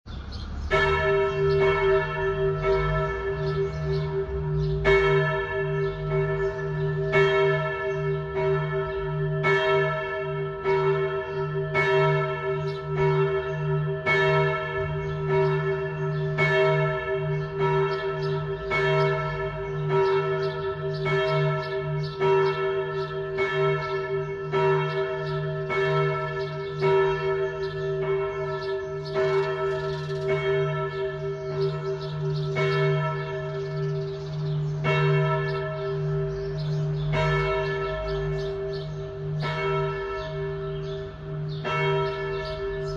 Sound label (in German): Groesste Glocke